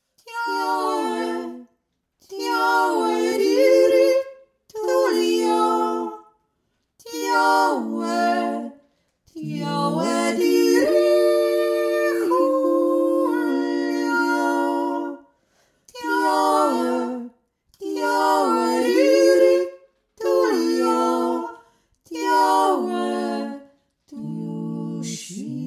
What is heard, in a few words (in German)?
weise dreistimmig